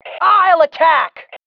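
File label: attack, female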